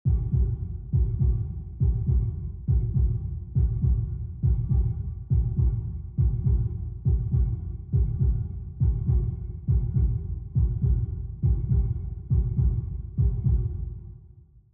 ambience heartbeat spawning loop

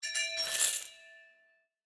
ui salary

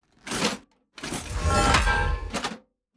hammer of justice strike

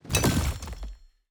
successful coridor build

